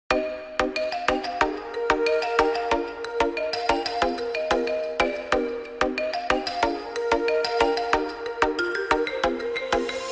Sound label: Message Tone